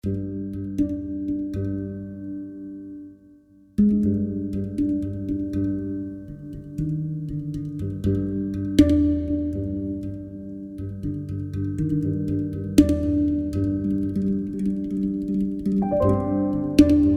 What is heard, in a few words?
pandrum duet